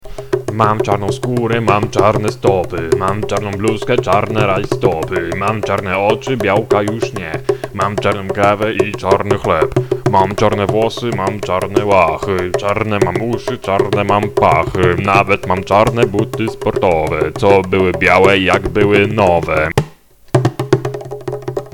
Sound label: song